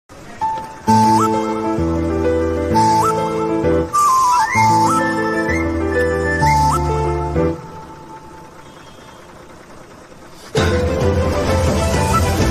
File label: bus chasing scene bgm telugu; paagal movie